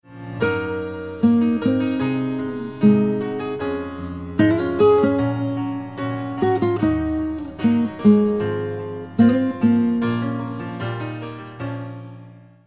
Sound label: guitar piano; ringtones